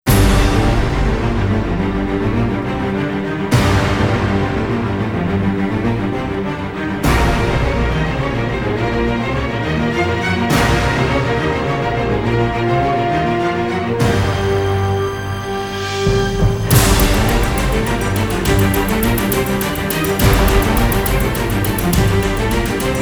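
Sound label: Orchestral, Horror & Sci-Fi, trailer music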